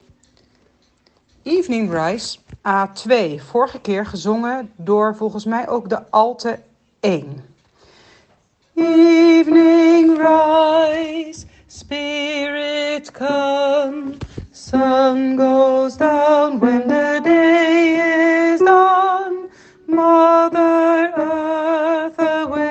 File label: evening rise